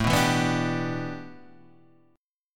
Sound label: A 7th Suspended 2nd Suspended 4th; A7sus2sus4 Chord {5 2 2 4 3 3} strummed